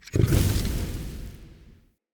Idol Mode In; Orengall

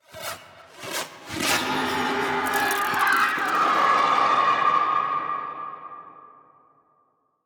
Sentients Enter; Sacrifice Forest Night

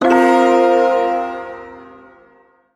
Shawzin Hirajoshi Chord; Warframe; Duviri Ersatz Shawzin; Chords

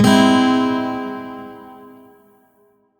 Shawzin Phrygian Chord I; Music; Warframe; Lotus Shawzin

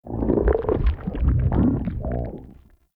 Stomach Grumble C; Warframe; Grendel

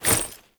Generic Foley; Warframe; Uriel

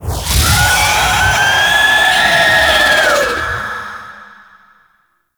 Raise Dead Cast; Infested; Arlo Devoted; Arlo Zealot

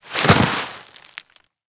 Generic Roll Dirt; Warframe; Characters

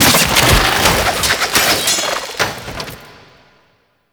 Fist Death; Warframe; Tyl Regor; Fists

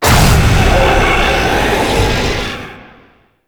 Puzzle Button; Warframe; Duviri Murmur; Medusa Puzzle

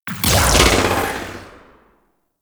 Tail, Weapons; Crp BFG

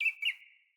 Bird Double Chirp; Josalfar Bird Guide

